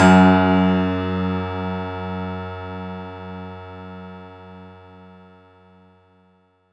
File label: F#2, synth; 90 s Sample CDs; NiceBeats; SynthLine VOL-23